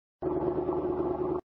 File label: motor; GraveDrive